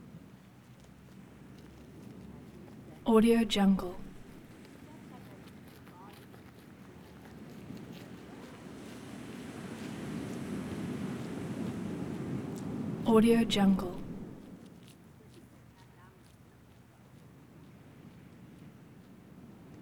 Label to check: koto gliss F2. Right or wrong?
Wrong